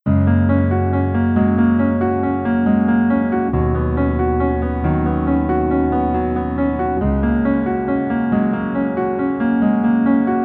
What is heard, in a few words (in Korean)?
Piano ver 멜로디MR; 이태원 클라쓰